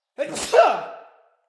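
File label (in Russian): мужской взрослый чих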